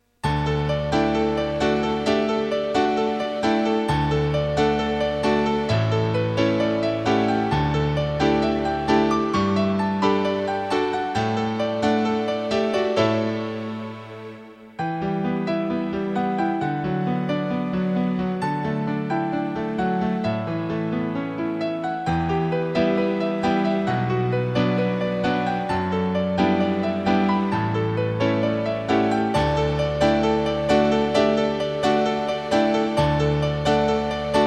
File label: fanfare dur